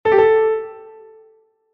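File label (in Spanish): mordente superior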